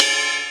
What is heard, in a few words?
Ride, Yamaha TG-33; Drum Samples